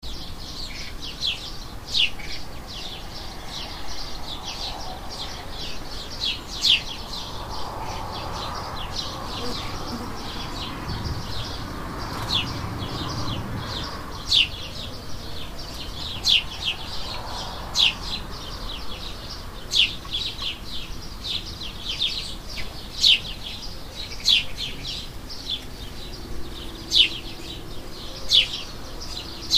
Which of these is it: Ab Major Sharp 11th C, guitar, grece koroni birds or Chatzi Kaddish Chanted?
grece koroni birds